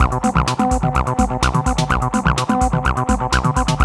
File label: ACID JAM